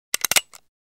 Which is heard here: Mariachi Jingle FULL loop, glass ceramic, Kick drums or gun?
gun